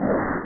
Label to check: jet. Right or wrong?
Right